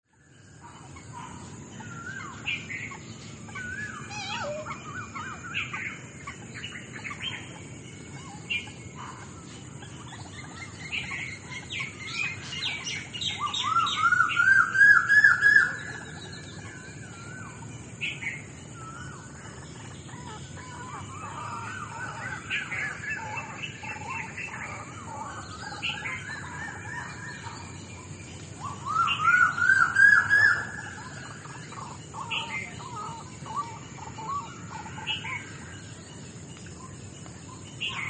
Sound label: Dawn chorous at Talangama; Sri Lanka